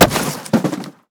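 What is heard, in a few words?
destroy cardboard small; Call of Duty Modern Warfare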